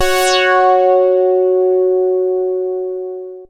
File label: MASS LEAD, SYNTH; Keith Emerson Signature Series